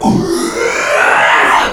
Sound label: inhale